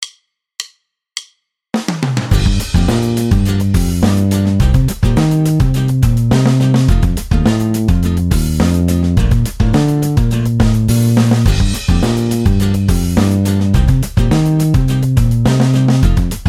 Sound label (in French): accents exercice, basse; exercices